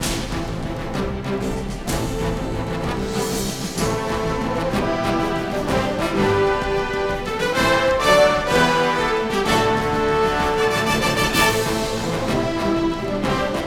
Combat; The Hobbit; PS2

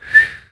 Snort; Neighbours From Hell Console Edition; GC, Xbox

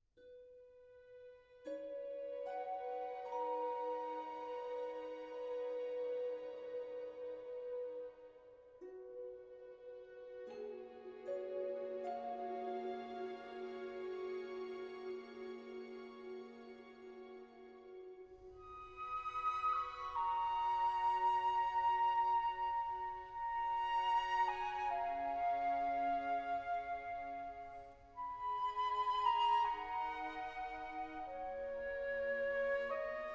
76bpmvar 4-4; Planet of Lana

